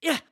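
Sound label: Hurt, voice; BOTW; Breath of the Wild; Link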